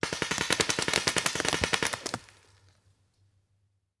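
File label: Paintball Gun Impact; Sound Ideas; Guns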